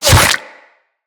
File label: Bullet Flesh; Impacts